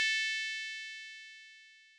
調波構造音（10～30本） 高域, 仮想ピッチ; 音の高さ; 高域下がり・時間減衰波

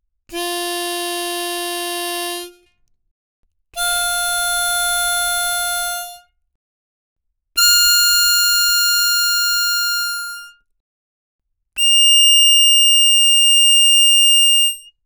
Free Reed, 気鳴楽器

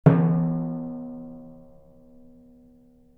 ティンパニ; 膜鳴楽器; 打奏; ティンパニの波形の概周期性

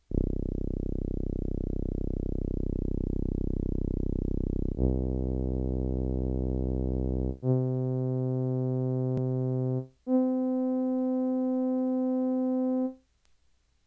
ミニ・モーグ, 電鳴楽器

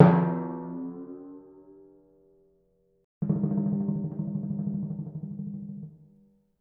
Timpani 奏, 膜鳴楽器 打奏; Timpani-奏法と打叩強度による違

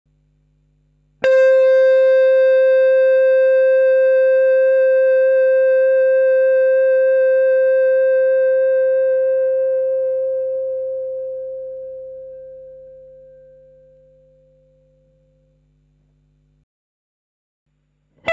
エレキ・ギター; 電鳴楽器; フィードバック奏

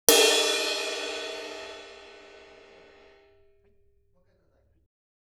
シンバル; 体鳴楽器; 打奏

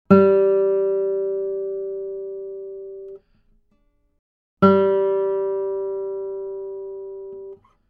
撥弦; 弦鳴楽器; G3

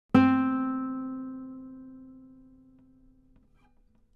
撥弦; 弦鳴楽器; 河野賢; C4

